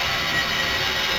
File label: future laser; valve; deathrun crash2